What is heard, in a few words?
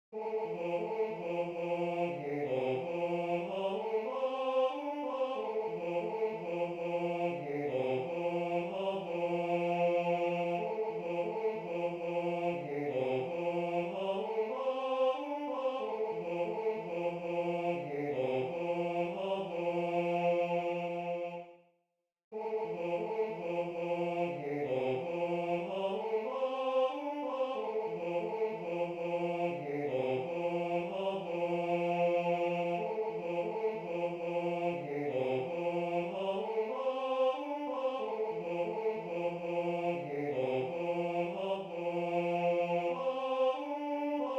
Tenor II; Little David Play On Your Harp